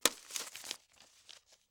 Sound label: Paper Crush; Fairlight Sound Lib